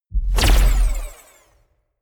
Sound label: sci-fi plasma gun fire digital; pistolen gewehre